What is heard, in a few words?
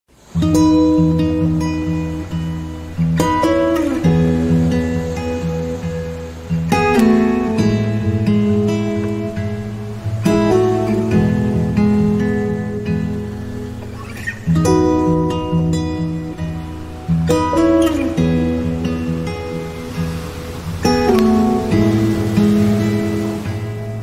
Music and sea; دریا و گیتار